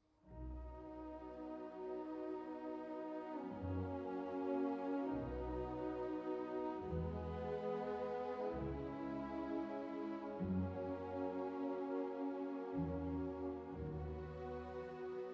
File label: Piano Concerto; Beethoven